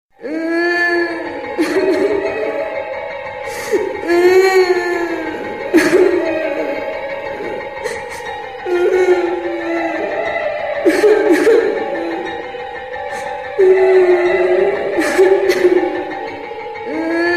Horror Crying Sound

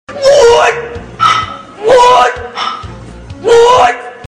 Gettin My Grunt On

